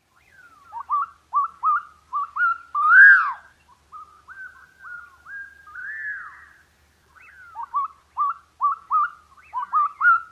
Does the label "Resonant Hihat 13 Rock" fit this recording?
No